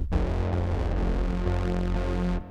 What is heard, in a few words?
Polyend 12 Bass